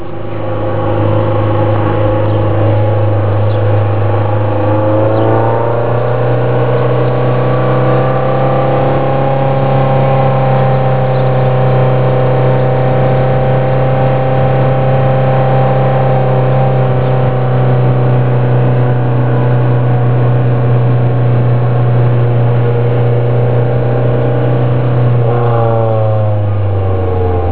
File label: exhaust milltek